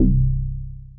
BASS Organ Based - C; Synth One Shots

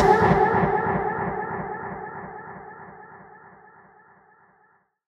Voice Tweak G# 140 BPM, Psytrance; Voices; Tweaks; Ghosthack; Psytrance Visions Volume 2

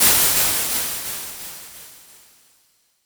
Fx 130, Techno; Loops; Ghosthack; Free Techno Sounds 2024

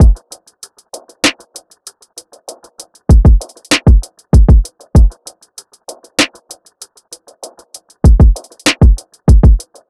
Drum Loop 97 BPM; Waves; Cymatics; Vibes II Drum Loop Collection

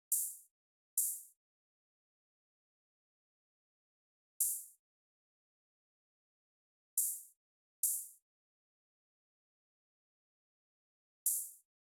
Drum Loop 140 BPM Open Hat; Loop Stems; Cymatics; DOPE DRUMS VOL. 1; Wanted

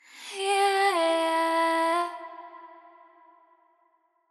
Chant, Vocals; GhostHack

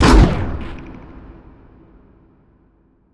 impact electronics; Hits, Impacts

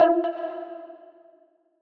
FX Vocal Impact (E); Vocal Impact 2

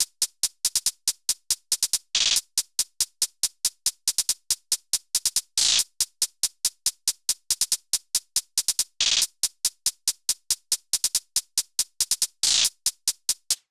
Hihat Loop - 140 BPM, Hip Hop; Drums - Loops; 9God

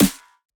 Snare, Dubstep Snares

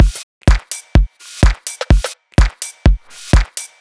Acid Drum Loop - 126 BPM, House; Full Drum Loops; Cymatics

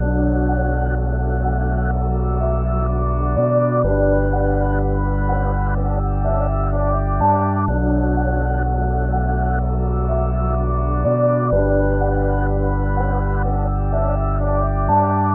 Nostalgic Melody Loop - 125 BPM B Min; Cymatics; Oracle Sample Pack

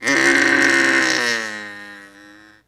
Bronx Cheer; Vintage Cartoon; Comedy Vocals

